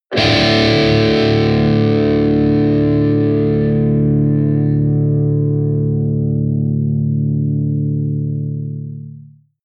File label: electric guitar distorted powerchord low sustained